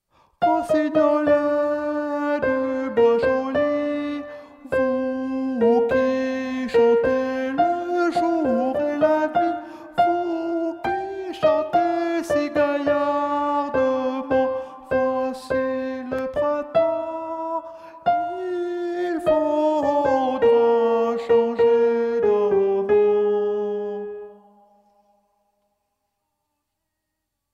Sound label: tenor; ROSSIGNOLET (ténor chanté)